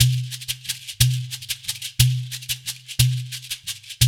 Shekere; Spectrasonics - Supreme Beats - World Dance

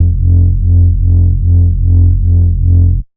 bass sus beating F; Polyend; ARC Noise

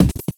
Pickup, Drums & Percussion

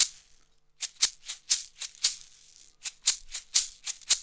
Roots Reggae; Sounds Good

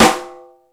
Rock N Roll, E-MU Pro-Cussion

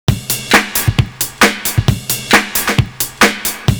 clear beat, 133.5; Univers Sons - Kitch 70s

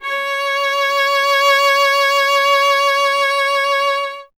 viola C; Miroslav Vitous; Solo Instruments VOL-1

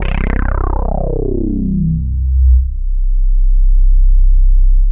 Korg POLY800 C1; Back In Time Records; Korg Universe VOL-1

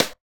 SD, HIP, Dance Mega Drums

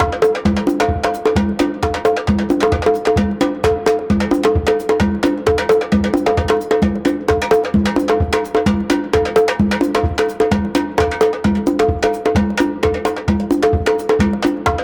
CONGA BEAT; Zero G - Ethnic

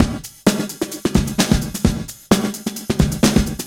Beat (130BPM), Gated Reverb; musicradar

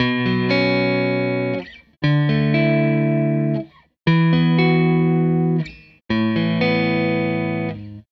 Tele Guitar Loop

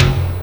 Dance Drums; Best Service; ProSamples vol.15; AKAI

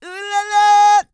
SING, World Voices, CARIBBEAN

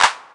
clap high classic base, Drum Hits; Classic Chicago House; claps

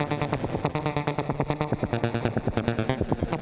Jen Pulses A 140 D, modular 140bpm

